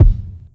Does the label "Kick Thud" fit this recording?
Yes